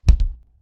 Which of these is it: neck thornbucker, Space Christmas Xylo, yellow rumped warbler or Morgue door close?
Morgue door close